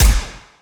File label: Pistol Fire Longer Tail; Robobeat